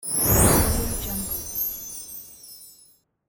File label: magic sparkles